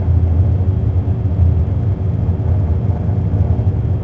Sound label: elevator running; Max Payne